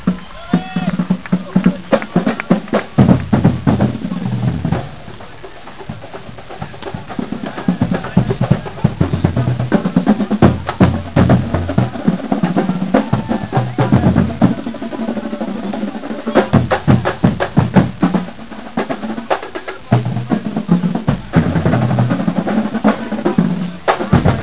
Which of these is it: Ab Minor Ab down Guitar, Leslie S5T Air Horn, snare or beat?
beat